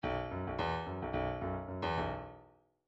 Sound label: midi file grooves rock